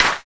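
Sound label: PER PROCU, Procussion, percussion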